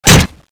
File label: wood, weapons; thanatos3